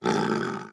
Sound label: Wolf Stretch; Wolf Link